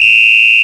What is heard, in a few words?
Whistle, Roland R8 Percussion; Drum Samples